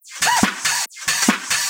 Loop 140 BPM; vengeance essential club sounds-3

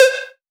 Synth Cuts; Vengeance Samples; VES1 Instruments

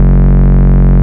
Root F#, Bass; Vengeance Samples

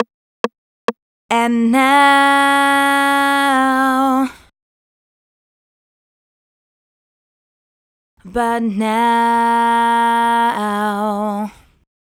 Bridge (2nd voice), 136 BPM; Vengeance Samples; Breakaway

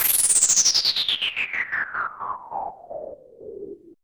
Downsweeps

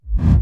BD Gate FX, Bassdrums; Vengeance Samples

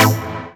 Synth Sound; Vengeance Samples; VEE Synth Shots

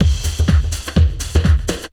drm 125, drumloops bassworx; house; PS-28 Vocal House Samples

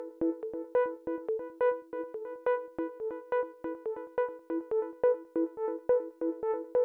Soft Pluck 140BPM, Eminor; Melody & Synth; Vengeance Samples

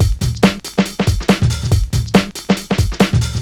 Breakbeat 140 BPM

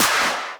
Clap; Vengeance Samples; VEC1 Claps

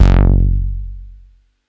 Bass Juno F#; Vengeance Samples

